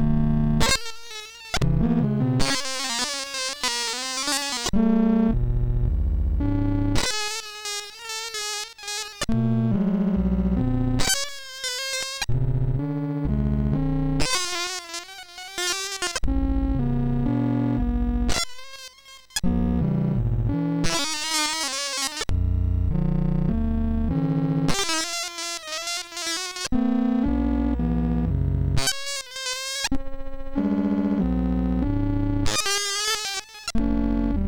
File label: Organ Torture, MOS6581